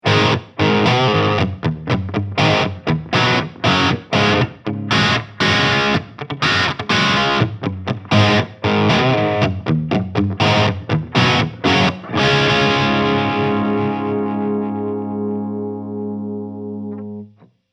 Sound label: Ibanez XPTB720 Crunch